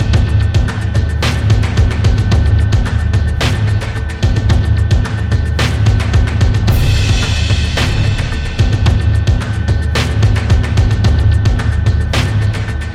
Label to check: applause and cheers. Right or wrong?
Wrong